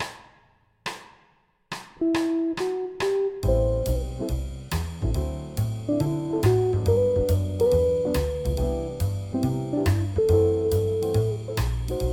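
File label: guitar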